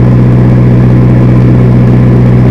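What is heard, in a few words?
seat on very low,car engine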